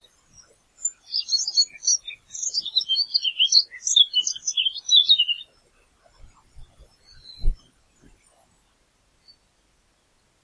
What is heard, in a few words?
Post Noise Removal